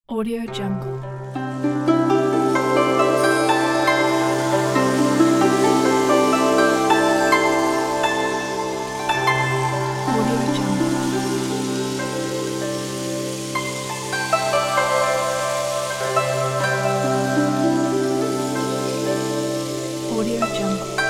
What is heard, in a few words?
relaxing chinese meditation with rain